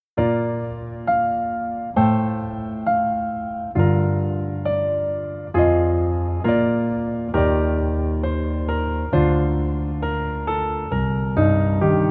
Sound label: Piano